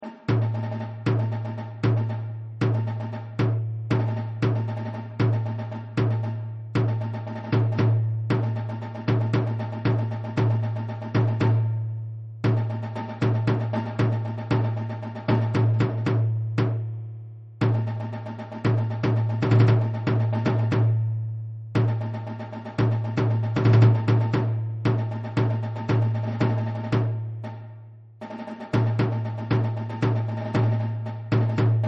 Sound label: snare overload